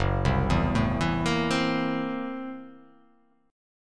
Gb 7th Sharp 9th Flat 5th Gb down; arpeggiated

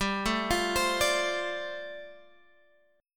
G 7th Suspended 2nd Suspended 4th, Guitar Open F

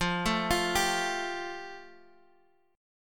F Major Add 9th Guitar Open F; Fadd9; 17,16,17,14,x,x; arpeggiated

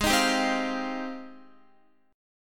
Ab Major 13th Ab, down, Guitar; AbM13 strummed; JGuitar

